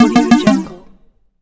win game; wildemotion

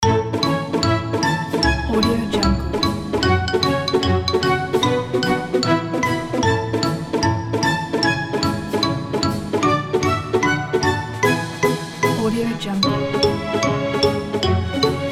classic fashion

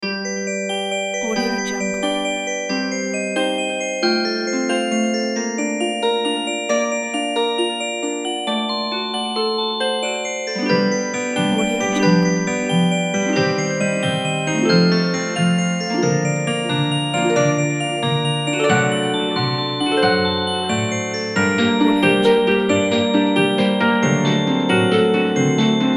lullabies for sleepy children

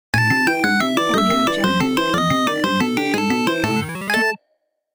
arcade interface stinger

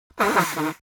human nose blow dry; smartsoundfx

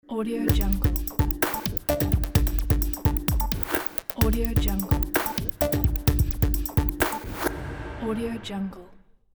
breakbeat logo

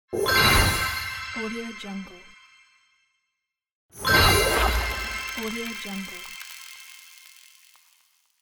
victory screen appear

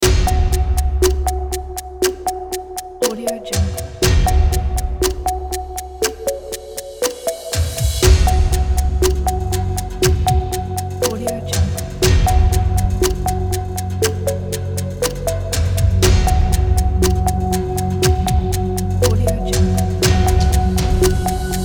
quiz countdown

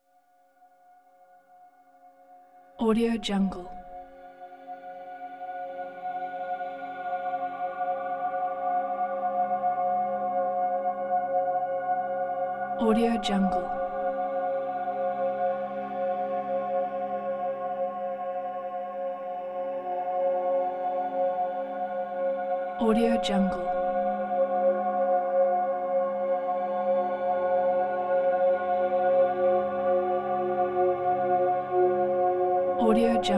heavenly presence eerie melodic tones mystical

